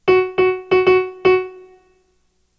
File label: rhythm recognition